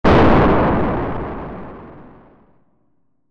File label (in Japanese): 爆発